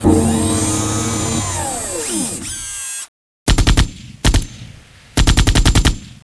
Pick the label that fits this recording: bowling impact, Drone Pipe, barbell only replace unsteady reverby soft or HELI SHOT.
HELI SHOT